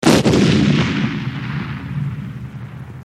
normal hitwhistle

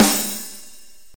normal hitwhistle

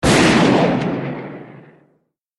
normal hitclap

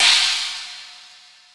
china cym mid

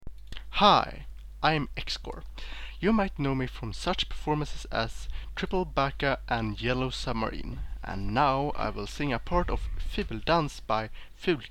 ugly singing

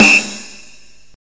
normal slider tick

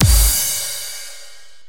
normal hitfinish

